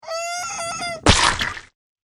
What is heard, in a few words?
dead owl